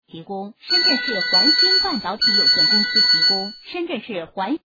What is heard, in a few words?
Ring Ding Ling Ling